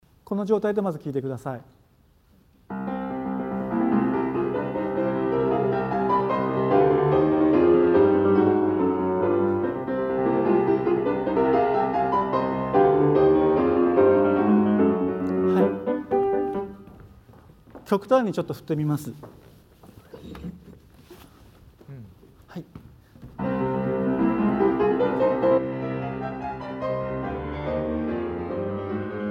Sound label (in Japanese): キャスターの向きによる演奏